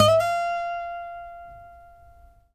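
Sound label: trill, Guitar; Quantum Leap; Guitar & Bass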